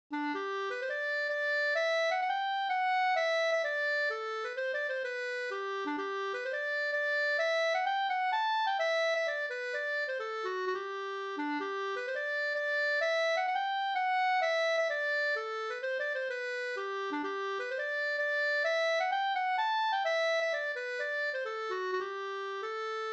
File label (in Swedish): hamburska; David Bastviken